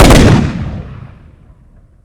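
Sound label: shotgun double fire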